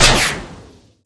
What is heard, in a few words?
Confirm; 暴力赛车